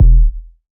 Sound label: BD, Waldorf Blofeld